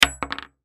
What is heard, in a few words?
Bullet Shell; 7mm Drop Wood; Blastwave; Warfare; Drops (Sound Effects:Objects)